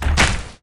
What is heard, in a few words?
beast move; Akeron